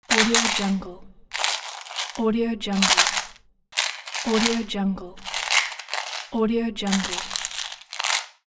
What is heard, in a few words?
box with clerical buttons